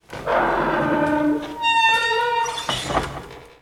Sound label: SQUEEKY DOOR OPEN; sloodle